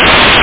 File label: stick